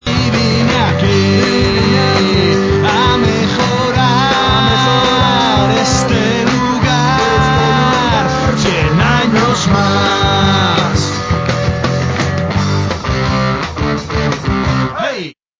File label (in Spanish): tono real